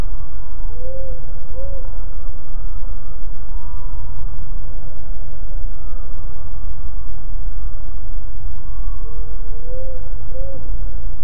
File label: Powerful Owl